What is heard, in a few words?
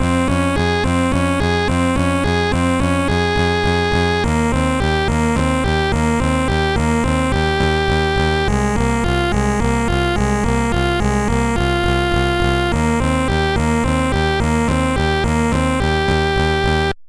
violin orchestra; HYDRA